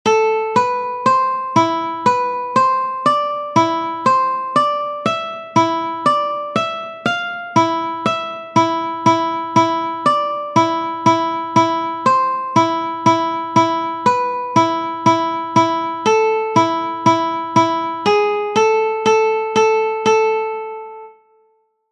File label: Classical Etude